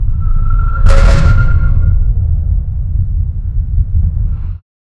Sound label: airship